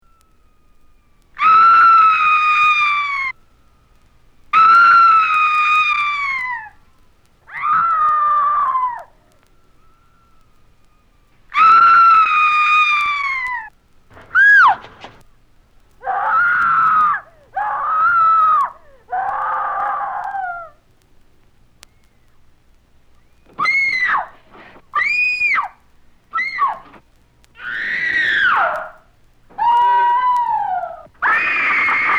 Screaming Humans; Halloween; Haunted House

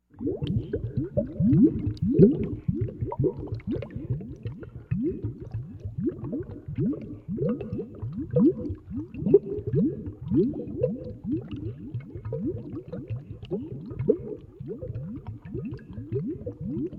Heavy Solution Boiling; Halloween; Halloween Sound Effects - Sounds Of Horror

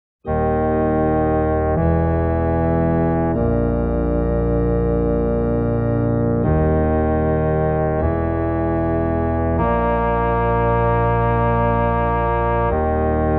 Scary sounds, Pipe Organ And Bassoon; Classical; Halloween

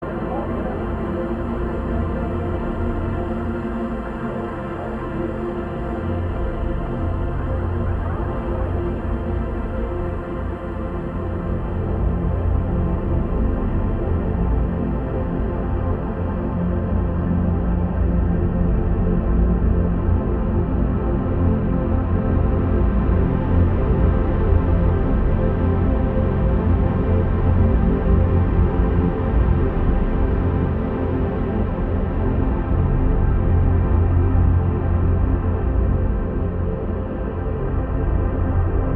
Jetstream, Ambient

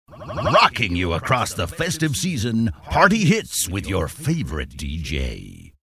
Christmas Jingles

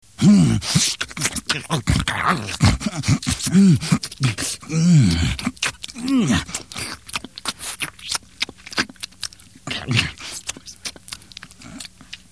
Mmm; Halloween; Hallmark Halloween 1989

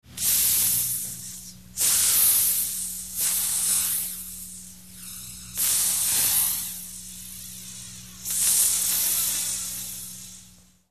Hisss; Halloween; Hallmark Halloween 1989

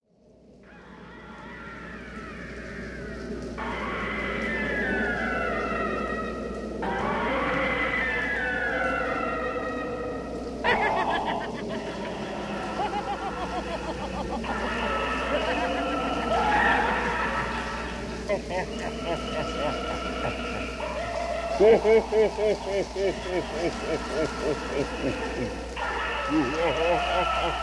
Demonic Laughter; Halloween; Halloween Sound Effects - Sounds Of Horror

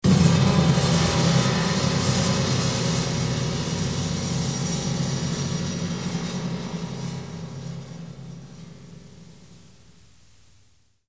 Music Cue; Halloween; Hallmark Halloween 1989

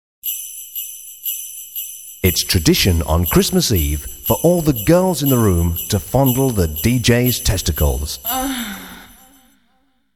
Christmas Jingles

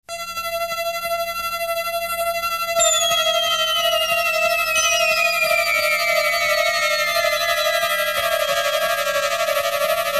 Suspense; Halloween; Hallmark Halloween 1989

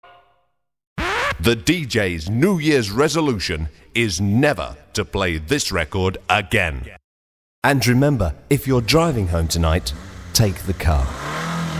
Christmas Jingles

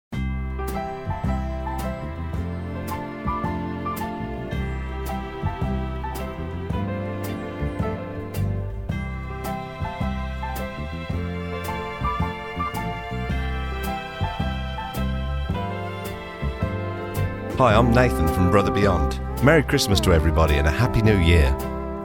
Christmas Jingles

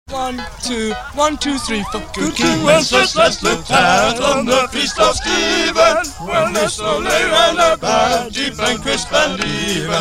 Christmas Jingles

